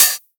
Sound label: open hihat; osu